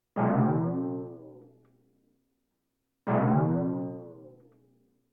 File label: Percussion Bongos Tympani rolls Gongs Drums